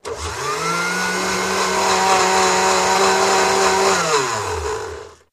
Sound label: Blender Strt Run Stp, appliances